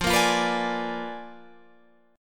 A sharp 7th Flat 9th F

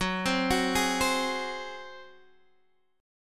Gb Suspended 2nd Flat 5th Guitar Half Step Down

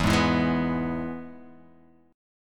E-Major E, Guitar Open G

